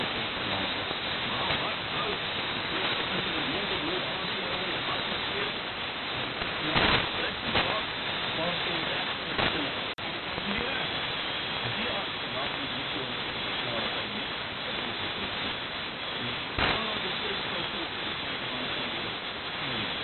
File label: 3320khz Loop; Sonder Grense; HDLA